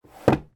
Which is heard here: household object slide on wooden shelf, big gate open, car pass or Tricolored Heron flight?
household object slide on wooden shelf